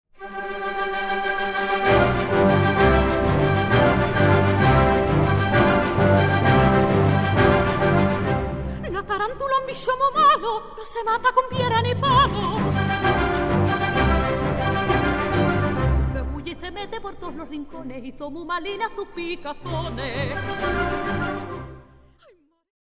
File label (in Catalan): Orquestra Solista; Auditori De Tenerife